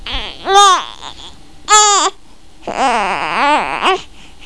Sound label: BABY WHI